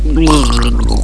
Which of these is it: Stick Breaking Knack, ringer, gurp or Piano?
gurp